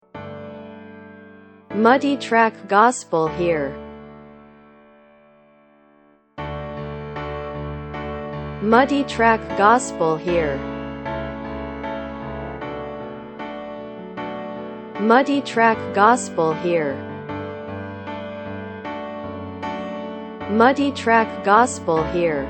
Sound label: PIANO